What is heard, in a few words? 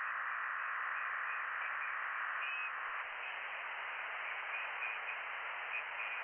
from radio, 7177KHz